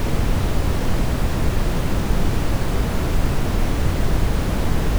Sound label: brown noise